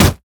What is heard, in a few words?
mech melee basic attack; blue; minions